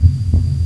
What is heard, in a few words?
heal